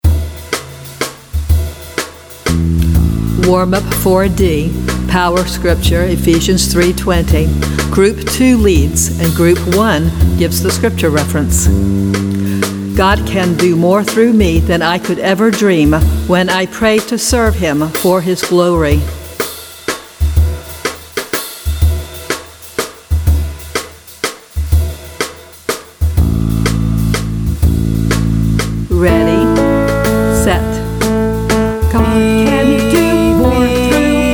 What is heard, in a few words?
Vocal Warm Up